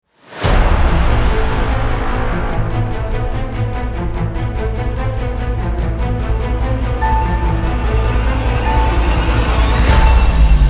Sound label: Action Trailer